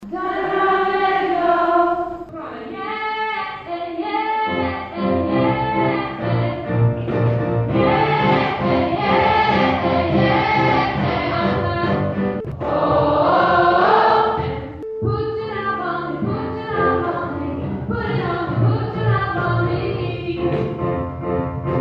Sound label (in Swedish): sopran, stammor